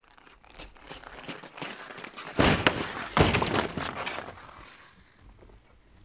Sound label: Hockey Skate And Board Hit; Sports & Recreation